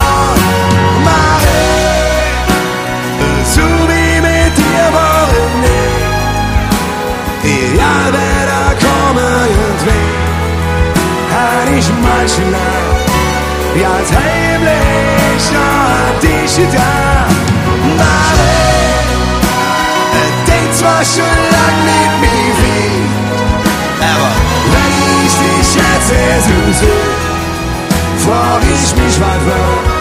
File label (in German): live mit orchester